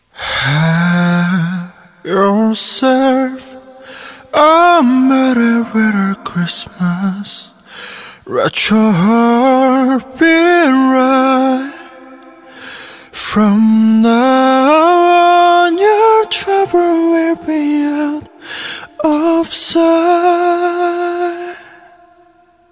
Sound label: merry christmas